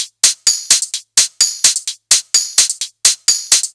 Loops 128BPM, Drumloops

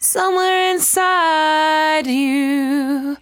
74-5bpm B, Velvet Vocal; While U Could; Zero-G

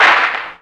KRAK, Clap & Snap; Roland L-CDX-01; Clap Modules

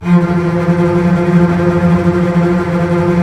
F 3, Basses Tremolo, String Ensembles; Miroslav Vitous; 90 s Sample CDs

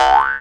C# JAW, Primitive Instruments

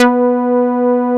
OB TITE B4; Drums In Your FACE!

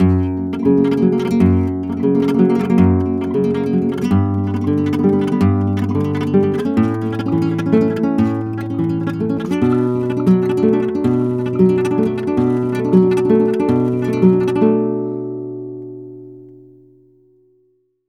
Flamenco, Guitar, Tremolos, f#

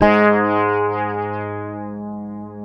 FINGERED, Bass; Sampleheads; Will Lee Bass Library

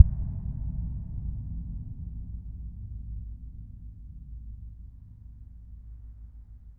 Steel Sheet Thud one shot; Sonic Foundry (Sony Creative Software) - Ambient Atmospheres & Rhythms; Ambient-Metals

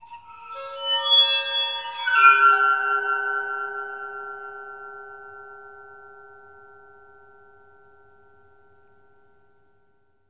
WATERPHONE; ILIO; Synclavier Percussion 2; World & Orchestral

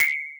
SFX Japanese Bell, Genesis; Shinobi III; M8

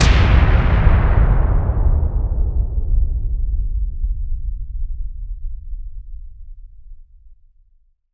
BLIP BLAM, WARHEADS; Spectrasonic Distorted Reality 1

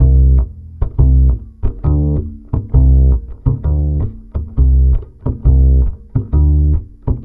BASS, 132, Balkanish Traditions; USB Soundscan vol.63; AKAI

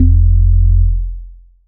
bass classic bound F; Polyend; ARC Noise

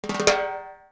Live Perc Riffs; Jungle Frenzy 1

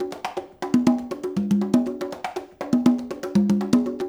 loop, congas; InVision Interactive; Percussion Slam

